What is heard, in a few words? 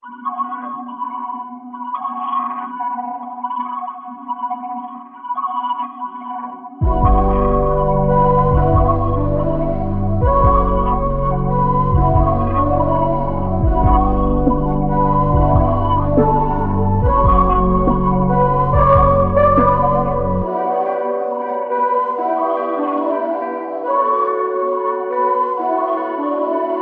141 BPM B Min, Melodies; Cymatics; 2022 Melody Mystery Expansion